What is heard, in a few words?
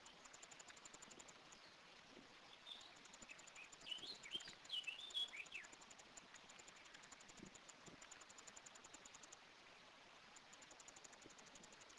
Tettigetta Argentata; Enregistrement Cigales